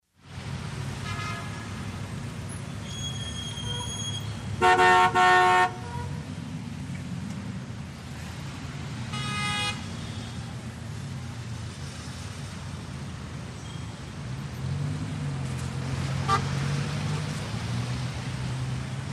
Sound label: NYC General Traffic, big city